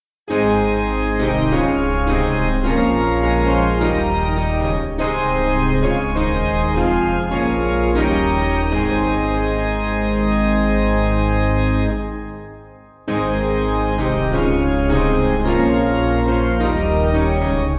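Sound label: Organ Piano; 4/Ab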